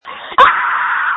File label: screams